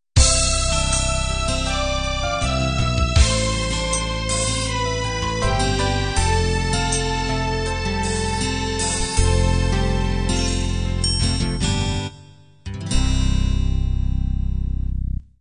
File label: guitar ballad end